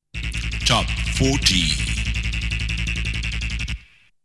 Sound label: Jingle